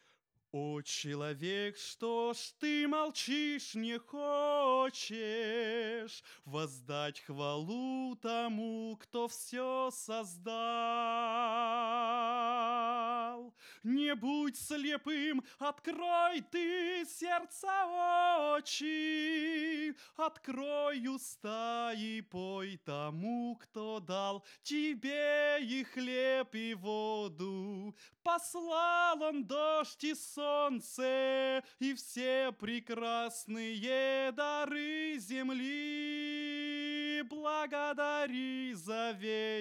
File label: vocal